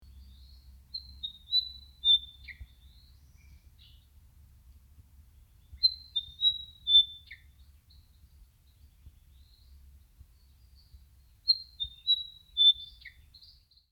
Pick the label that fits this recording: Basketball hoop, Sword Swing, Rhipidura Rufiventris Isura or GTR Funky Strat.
Rhipidura Rufiventris Isura